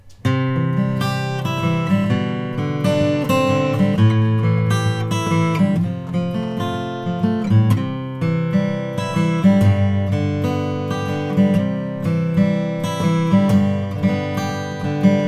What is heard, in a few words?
martin om 42 koa natural